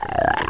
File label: zombie idle